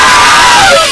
dreamer pain

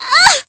pain

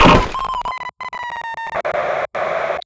die; clonecop

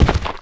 footstep, zombie

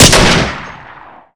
rocket fire, rpg7

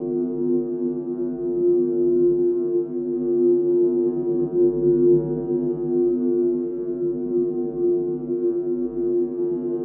energy orb loop

